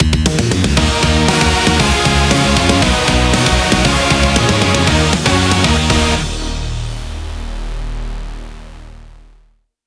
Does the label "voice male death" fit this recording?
No